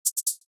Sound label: Sizzle